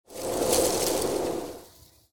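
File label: gust of wind with dry leaves; Звук ветра; Звук ветра для перехода в видео или для монтажного перехода в аудио монтаже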